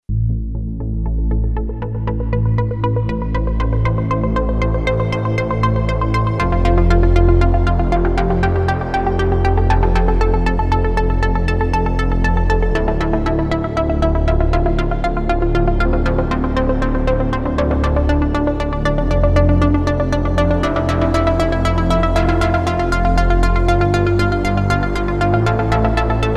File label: DRIVEN LFO PLUCK SPLIT; Redshift 6